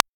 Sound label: Dr Kick